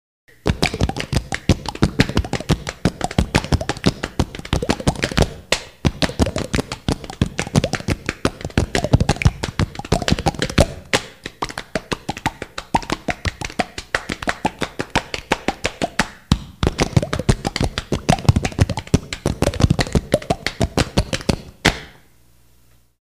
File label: poor mans electronic drumkit